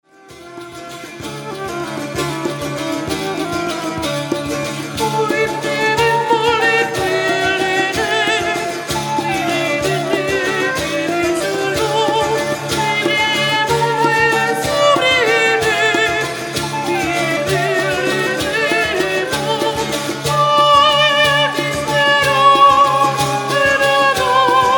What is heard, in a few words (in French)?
La Valse